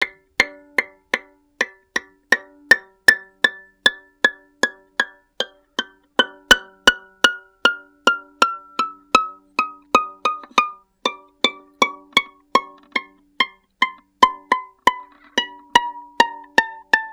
Violin Extended Technique

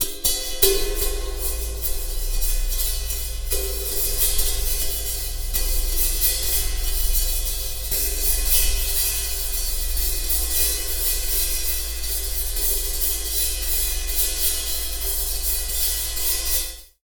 Cymbal; Percussion Samples

